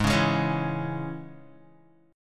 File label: Ab Suspended 4th Sharp 5th Guitar, chord; strummed; JGuitar